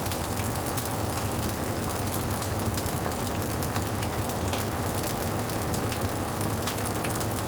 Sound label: fire; DraculasCastleWD; wazdan